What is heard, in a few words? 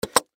Button Metal Switch, Button Metal Switch Mechanical; Clicks; Foley; Objects; Blastwave